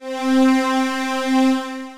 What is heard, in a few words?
Double Strings Layered, String Machines, Roland RS-09; Ableton; Factory Packs; Retro Synths